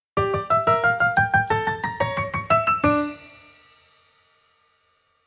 happy klezmer dancing piano with soft percussion ending